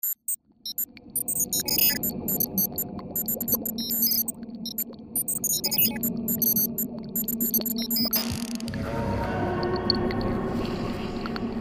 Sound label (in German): raumsound